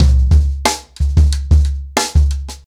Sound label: 90BPM, ACOUSTIC DRUMS